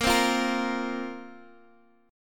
Bb Suspended 2nd Bb down Guitar Half Step Down

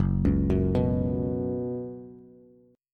Gb Suspended 4th Gb, down, Bass; arpeggiated; JGuitar

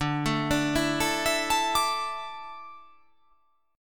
D Suspended 2nd D down

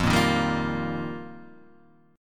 F 9th Suspended 4th F Guitar Open D, chord

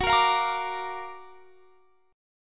Gb Augmented Major 7th; Listen to Gb+M7 strummed

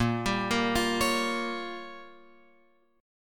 Bb Minor 6th, Guitar, Open F; arpeggiated; JGuitar

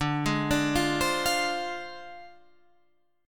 F Minor 6th D

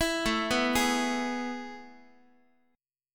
A Major 7th Suspended 2nd, Guitar; AM7sus2 arpeggiated; x,x,7,7,3,7; JGuitar

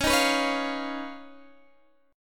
Eb 7th Suspended 2nd Sharp 5th, Db

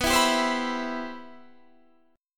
G 6th Add 11th B, chord; G6add11/B strummed

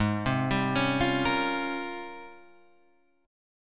G sharp Major 13th G sharp, down

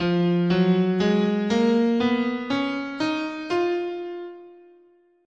F Locrian, scale

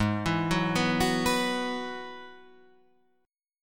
G sharp Minor 7th Sharp 5th G sharp down; G#m7#5 arpeggiated; JGuitar

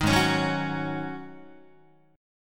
Ab 7th Suspended 4th Ab down Guitar

